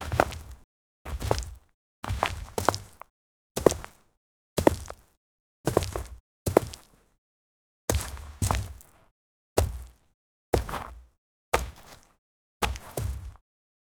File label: movement sfx footsteps; movement sfx 4 footsteps